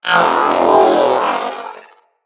Companion Vocal Death, Pets; Zanuka

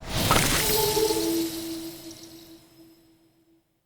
Seed Pickup; Objects; Pickups; Wevet

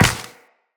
Step Ice, Footsteps, Walk; Tenno

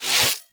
Electricity Shock Burst Fire Stop; Warframe; Corpus; Oxium Osprey

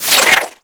Ink Rifle Reverse Start, Weapons; Warframe; Tenno

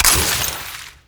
Ammo Pickup Corrosive; Ammo Ability; Warframe; Warframes; Frumentarius

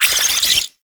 Oraxia Brood Spider Vocal; Warframe

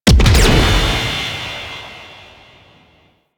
Jamming Drone Explosion; Warframe; Corpus; Corpus Railjack

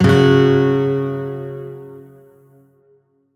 Shawzin Chromatic Chord; Music; Warframe; Lotus Shawzin

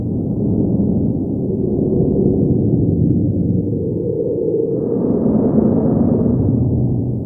Cargo Elevator Shaft Ambient Loop; Warframe; Guild

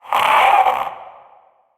Possessed Red Veil Vocal Death; Warframe; PriestQuest

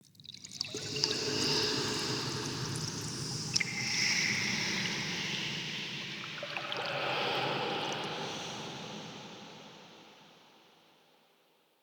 Trippy Breath; Ambience; Soul Plane

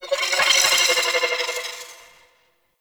Ship Market Data Blips; Warframe; Corpus Ship Remaster

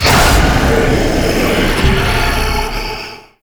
Puzzle Button; Warframe; Duviri Murmur; Medusa Puzzle

